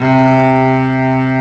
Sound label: CELLO C3